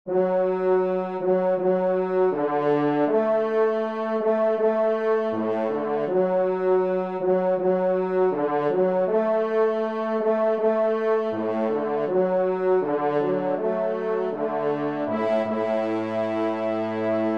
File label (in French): trompe; Les Chenes de Troncais; J Poncet